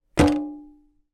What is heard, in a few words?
industrial paint pot large metal down on top of another